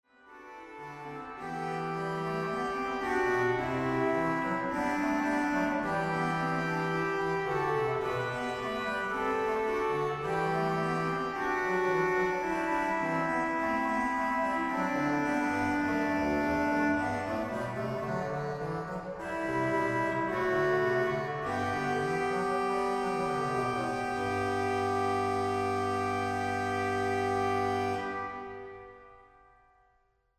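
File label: orgue; Johann Heinrich Buttstett; Nun bitten wir den Heiligen Geist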